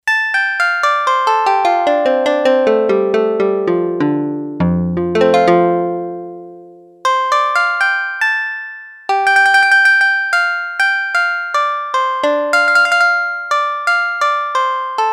Koto, Yamaha DX11; Factory Preset Voices

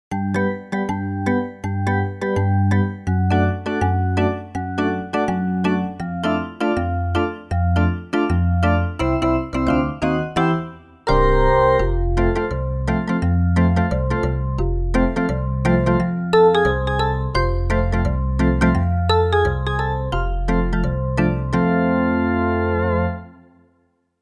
Organarimba, Roland JV-880; Factory Patches

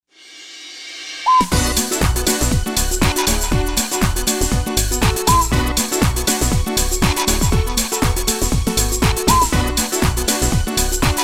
Roland SC-880; Factory Demo Songs; Toughparade

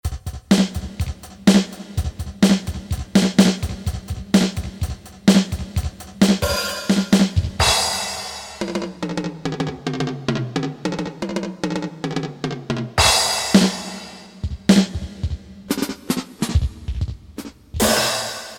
ROCK, Percussion

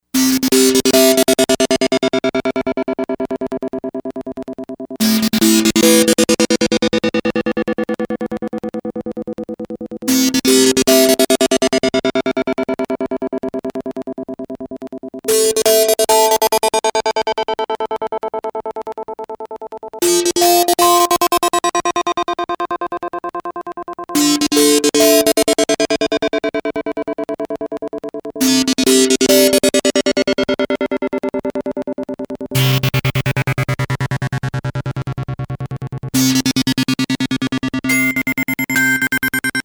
Mars to, Yamaha DX100 Factory Patches

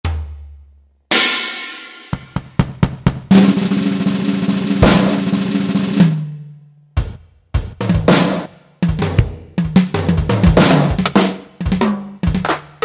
DR, Yamaha TG77; Factory Presets; 63 DR Both

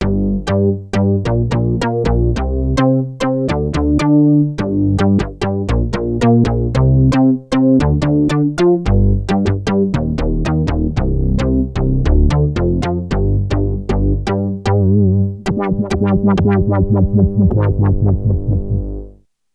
ANALOG SYNTH-BASS, Korg DW-8000; Factory Patches

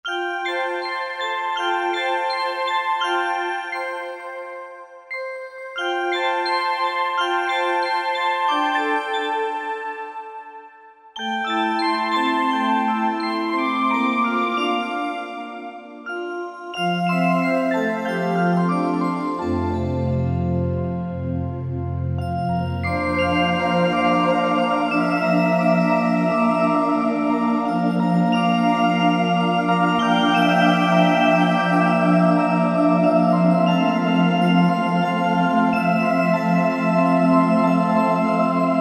Song Bells, Korg Wavestation A-D

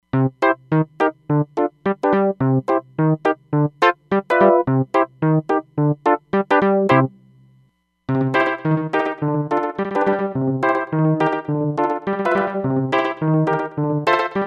Pan Slaps, Roland DEP-5; Factory Patches

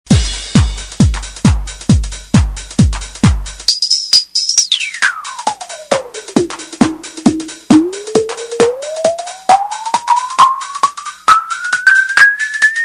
Roland TR-909 filtered by Electrix Filter Factory

